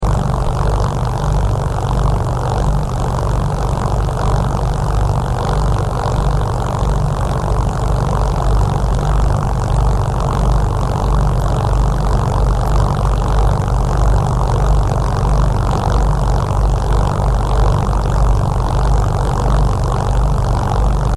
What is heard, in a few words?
SCI FI MECHANICAL LOOP; Various Sci Fi vehicle effects; Spacecrafts (Sound Effects:Science Fiction)